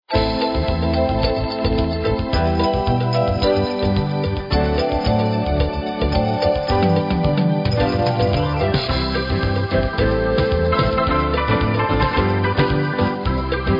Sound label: Samba